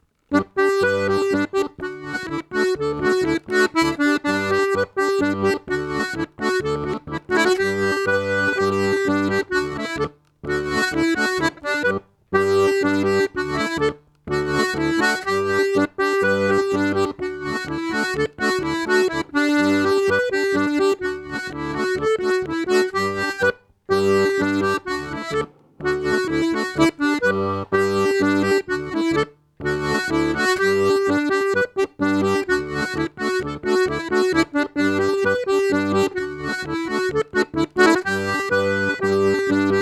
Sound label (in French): polka piquee